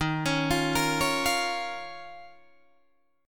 Eb 7th Suspended 2nd Eb down Guitar DADGAD; arpeggiated

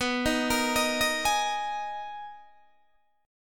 B Major 13th B down Guitar DADGAD

